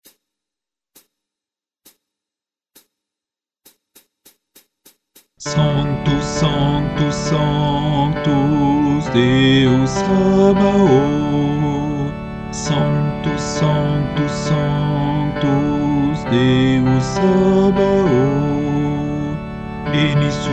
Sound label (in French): Messe de St Boniface Sanctus Guide Chant Basse